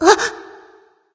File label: nv confused; cupid